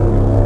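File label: engine loop; vladimir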